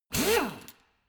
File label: impactor short soft; maschinen; bohrer